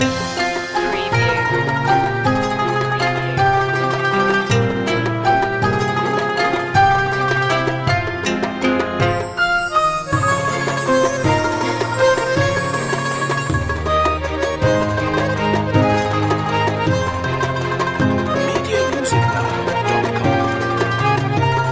world valse loop

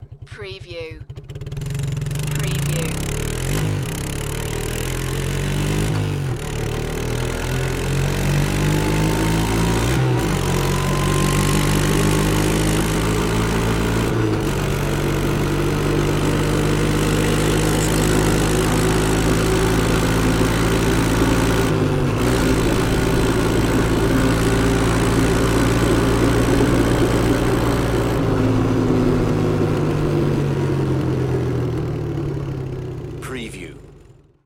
Quad Bike On Board Driving

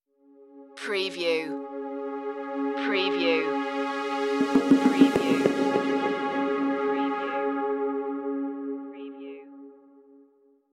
ocean flute stinger

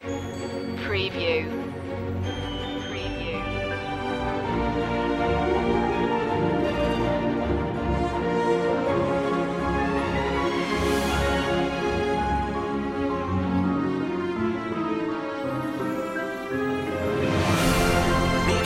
fantastique middle no choir

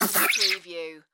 Squish Squeeze Suction

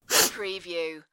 sniff

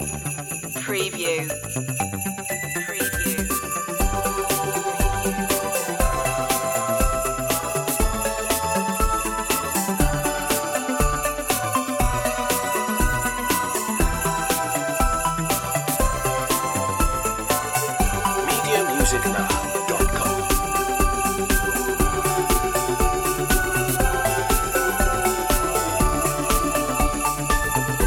merry christmas synthified

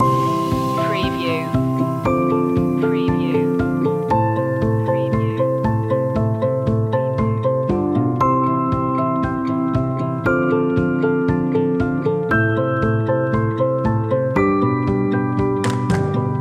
travel the distance loop

